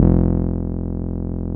RMI E.Piano; Roland LCDP10 Keys of the 60s and 70s 2

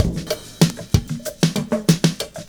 PERCS, 70 s Breakbeats

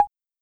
Conga Hi, Roland TR-33

